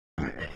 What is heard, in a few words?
attack; scmod; humanerror; agrunt